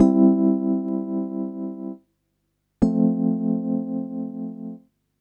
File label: Rhodes, Melodic Synth; Sonic Foundry; Fluid Dynamics Drum & Bass